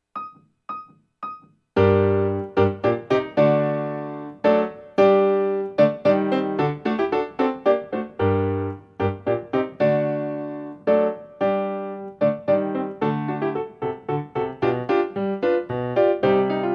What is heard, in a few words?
Eccles Sonata Mvt 2 ACCOMP